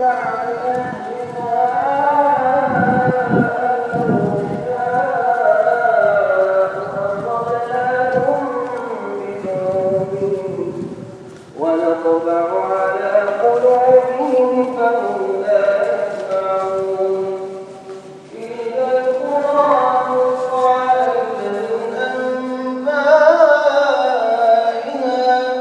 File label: syrian imam at mosque amazing voice, qatar doha; katara